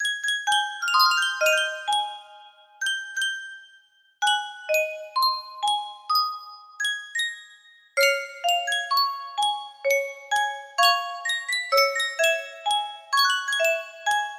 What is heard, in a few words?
Yunsheng Music Box, music box melody